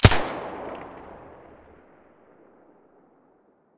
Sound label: silencer mp9 stereo, weapons